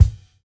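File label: kick, Roland MV8800 drum kit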